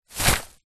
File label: shopping bag plastic full pick up; foley